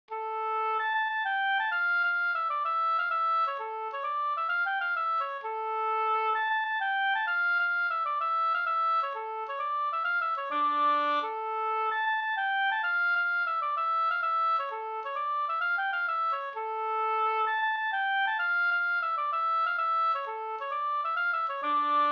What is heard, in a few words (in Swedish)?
polska springlek